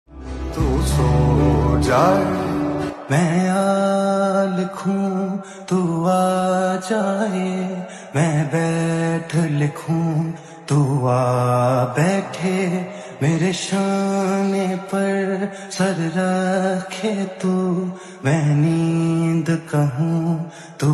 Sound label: hindi song